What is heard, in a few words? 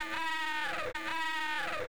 Distortoflange; Sonic Foundry (Sony Creative Software); Jazz Solos and Sections; Effected Sax